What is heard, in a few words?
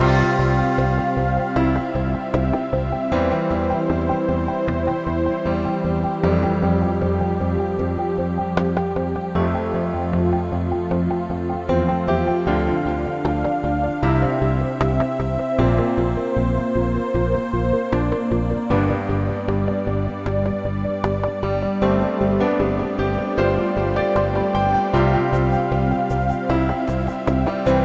church; Magic GameClient